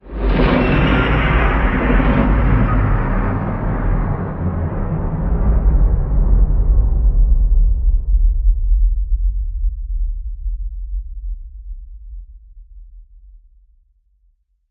Fly By Fade to Bass

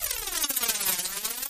Sharp Slicing Sweep

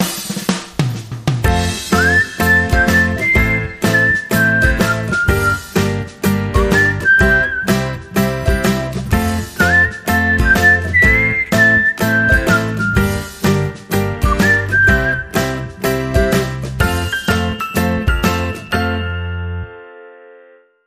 Whistling

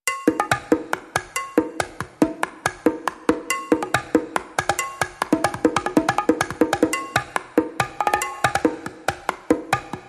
Light Percussion Only

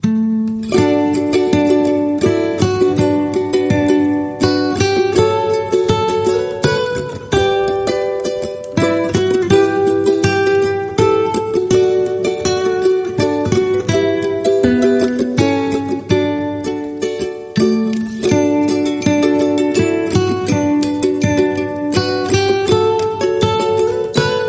Away In a Manger Ukulele

